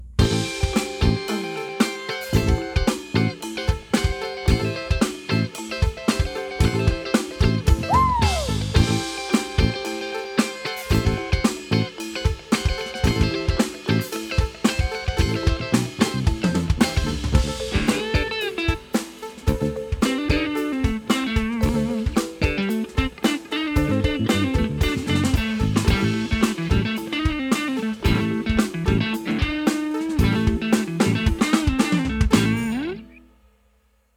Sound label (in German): git solo